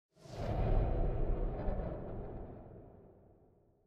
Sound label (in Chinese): 红色印记出现